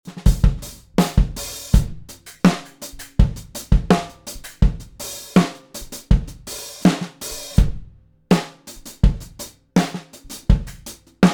Drum Buss Tanks Dry

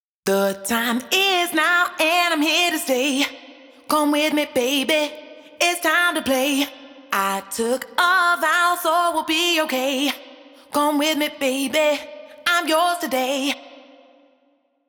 Vocal Sources

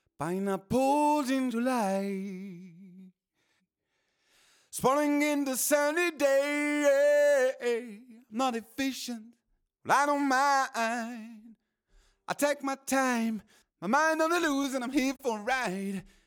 vocals dry; smasher

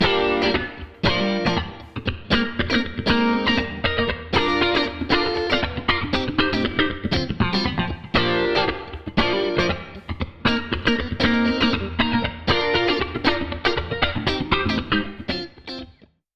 funk guitar buss hardware

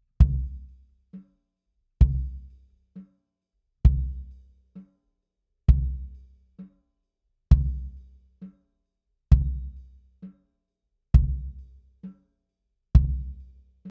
smasher bd wet

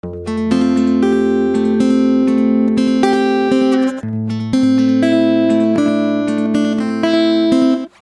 slapback-delay

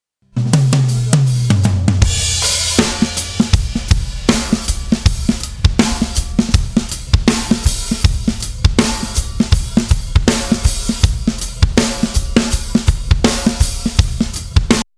rock drum buss hardware

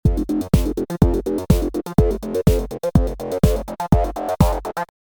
echorec electro dry

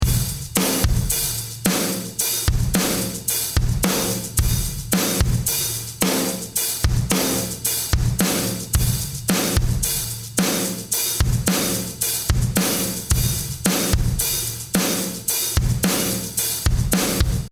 drums wet; smasher

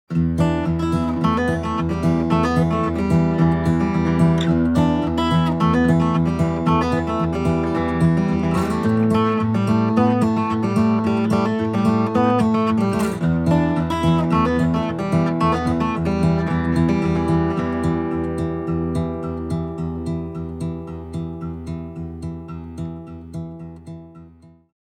Acc Guitar Dry

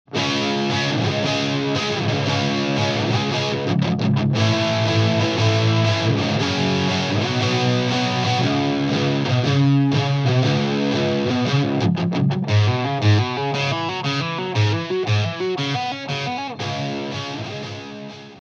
Electric Guitar Bus Unprocessed

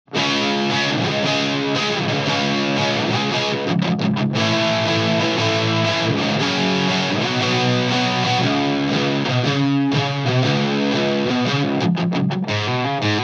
Electric Guitar Bus Sharp And Tight

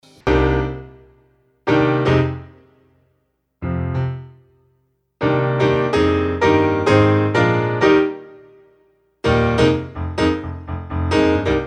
Pop Piano Dry

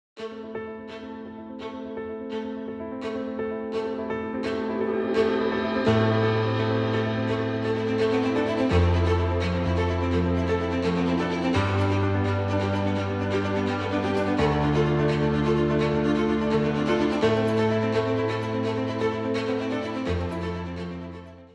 Cinematic Mix Air Filter 6 dB Boost

